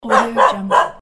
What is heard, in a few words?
dog sound